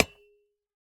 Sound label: Metal Hit